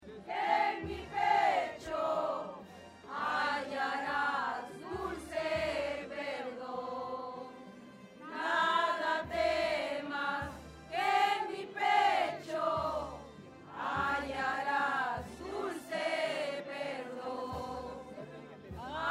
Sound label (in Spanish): SEÑOR DE LOS MILAGROS PROCESIÓN CANTADORAS